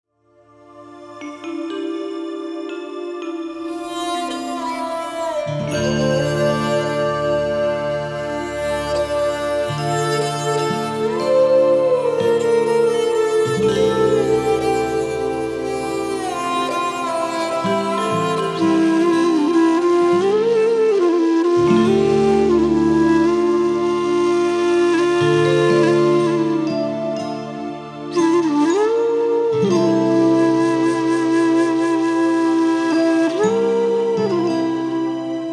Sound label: Bhairavi duet